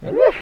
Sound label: attack; Jocker1